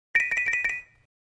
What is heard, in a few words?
Xylophone Shrt Trill, mallets xylophone